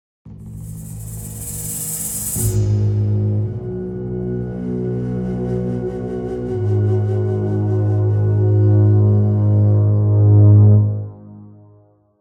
Tension Set Up

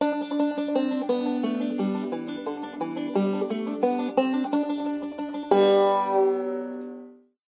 Loading; Card Wars Adventure Time; Android, iOS

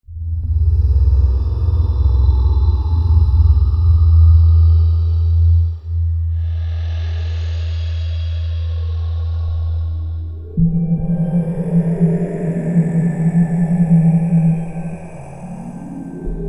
Killers House Soundscape; Seven Spirits

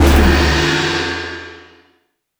Bullet Shot; gamerip

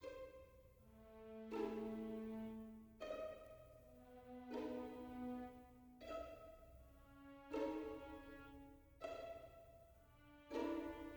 dark soft

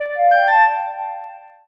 Unlock Secret

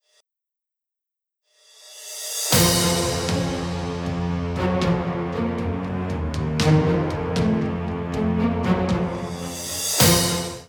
open music; quest hunter linux macos ps4 switch windows xbox one gamerip 2019

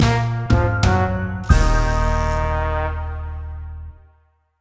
Fanfare Busted; Robbery Bob Man of Steal

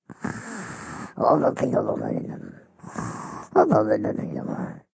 China Sleep; Cut the Rope: Time Travel

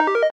Casino Congratulations

